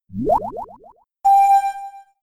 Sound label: Yawn; attack moves; Pokemon; Gen 5; Blk Wht Blk2 Wht2